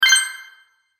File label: Magic Purchase Ding; Cartoon Sound Design